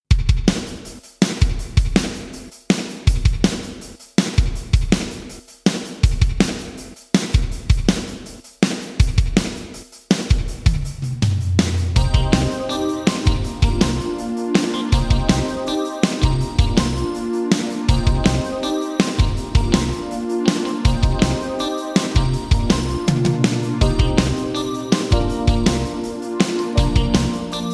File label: Drums Roland SRV 3030 Gated Reverb Vintage Digital